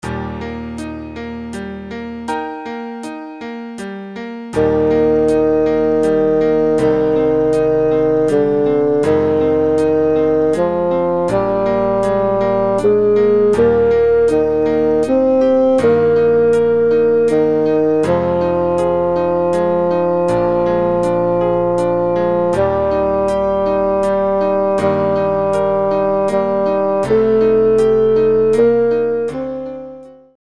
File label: let there be peace bass sample, backing tracks